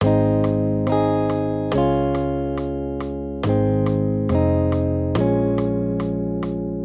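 Guitar, Accompaniment, Major Triads, Ab-Db, 140 BPM; maj5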